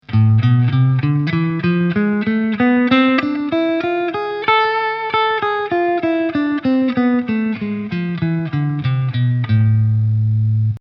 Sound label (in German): A harm. Moll 5. Bund